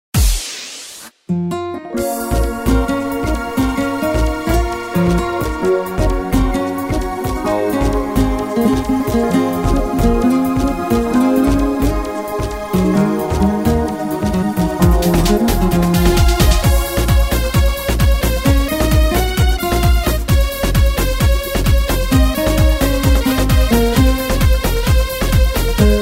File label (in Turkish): popbook, tyros 4 tyros 3 dugun set